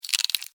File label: Insect, Movement, Crunch, Bug; Sound Effects:Animals:Insects